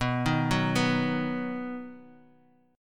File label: B Major, 4G,5C,5E,5A